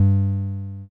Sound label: bass